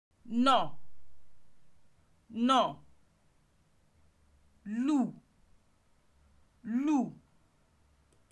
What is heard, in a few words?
BEAT